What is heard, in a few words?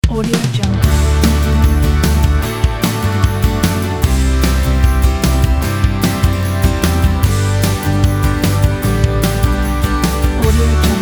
commercial pop rock ident